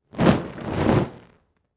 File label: Fire Torch Movement Double; Fire and Explosions